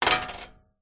foley money coin drop into empty metal collection drawer